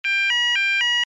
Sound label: alarm tone, sounder alarm tone; sounder beacon